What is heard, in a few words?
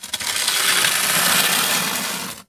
scrape; Cosmic Rage; activities planetoid